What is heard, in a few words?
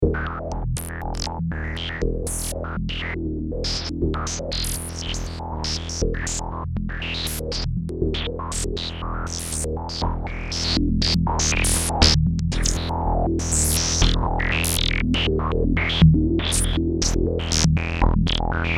Dreadbox NYX V2